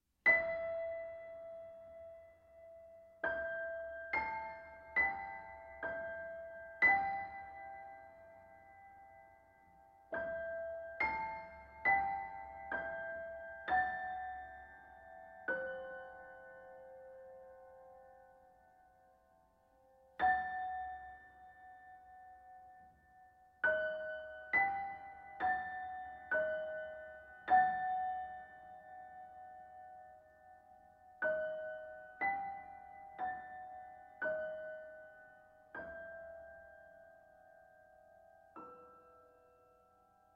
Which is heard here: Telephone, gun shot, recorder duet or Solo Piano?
Solo Piano